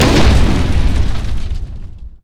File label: gangplank cannon; LoL; special forces gangplank